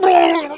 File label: Air Bail Male